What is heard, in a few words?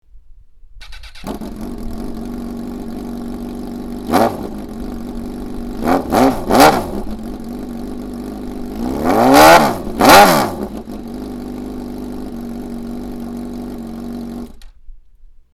CBR1000RR No End Can, exhaust sound